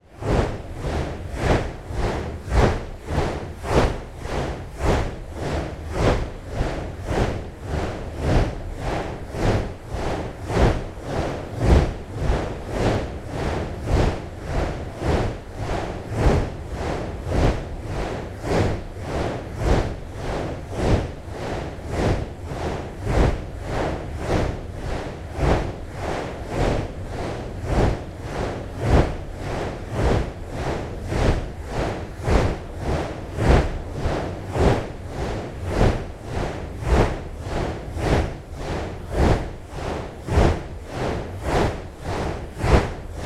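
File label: sounds of a wind turbine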